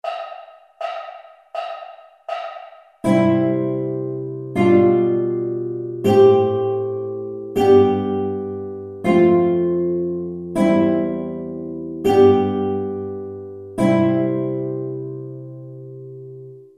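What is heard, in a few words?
1st string, guitar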